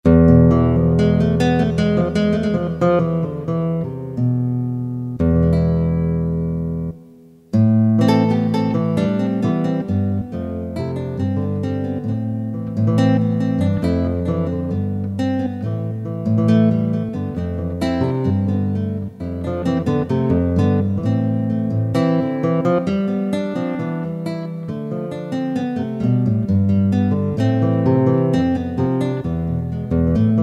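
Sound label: g in a minor; Kurzweil K2000; Kurzweil K2000 Value Pack; Xtra Programs; Jord Vox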